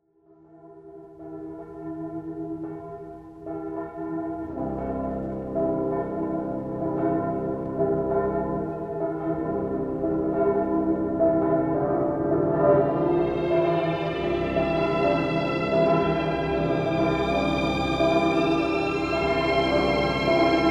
Kirchenglocken Zur Weihnachtzeit (Christmas Bells At Christmastime)